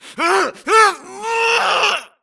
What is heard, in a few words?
grunt Struggle; Titanfall 2